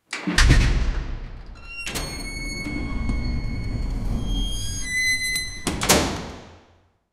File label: the sound of futuristic doors whooshing open